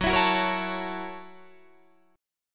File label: Gb 7th Sharp 9th Gb Guitar Open D